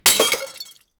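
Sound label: glass pot with water inside, breakage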